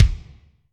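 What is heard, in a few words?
Kick Foot Room, Alesis D4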